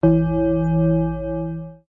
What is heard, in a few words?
tagesschau gong; bodeting